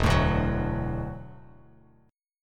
E 7th Suspended 2nd Suspended 4th E down Bass Standard; E7sus2sus4; strummed